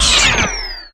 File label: pomson drain; drg pomson; tf